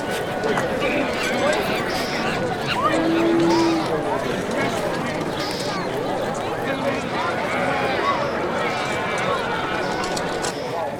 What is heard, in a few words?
ambient trade, building